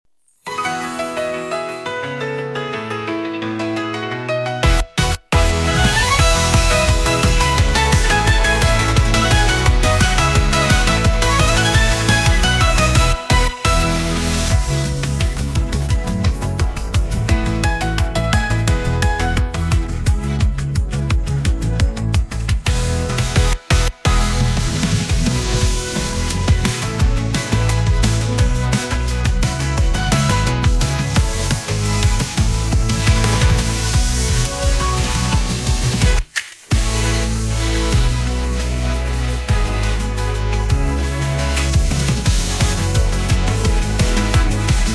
Instrumental

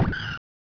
tires; mad cars

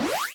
fast

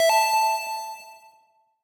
sound menu; 0-99-balls; coolmathgames

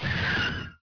dust skid; mad cars

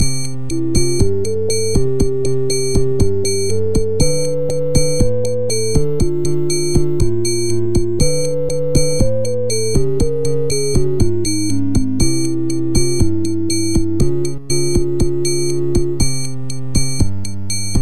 cutscene bossa; seagaia; ld22

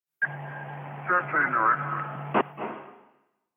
police; Flashpoint Ultimate 13; thelastnight

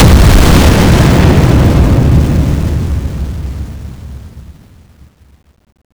nuke; madcars2; radicalplay

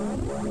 air; madcars2; radicalplay

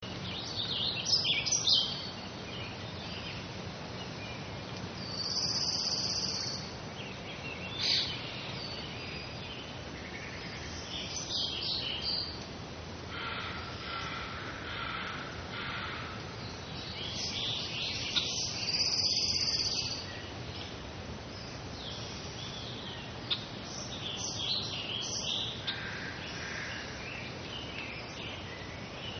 forest ambience

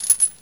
foley short, whips; Skyrim Special Edition; New Armoury

